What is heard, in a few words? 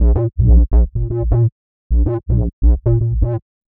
126 Bb BASS, Funky Underground bass loops; Loopmasters; Funky Underground Vol 2